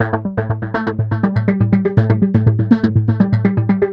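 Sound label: 122 BPM Sequence C major A minor Tonic F; innovative sequences 1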